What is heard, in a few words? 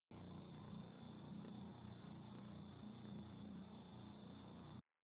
lightning